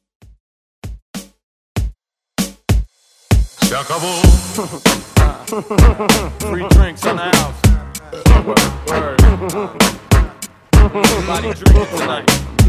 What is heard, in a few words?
Hiphop Redrum